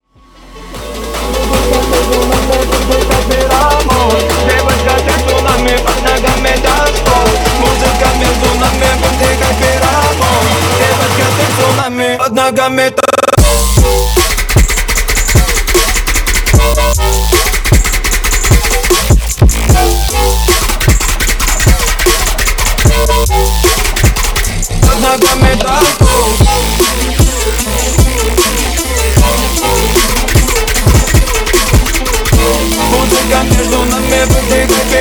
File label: trap remix